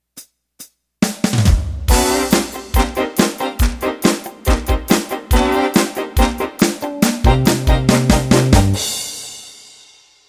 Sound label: Country Boogie Intro